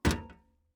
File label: metal land